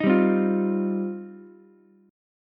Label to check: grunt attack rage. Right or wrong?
Wrong